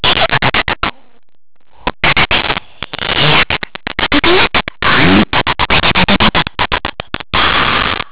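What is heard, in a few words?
vocal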